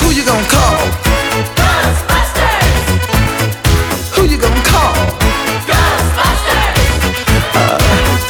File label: Vocals - Chorus Vox Short; 3rd Chorus